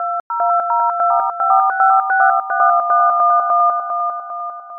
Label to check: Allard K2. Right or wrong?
Wrong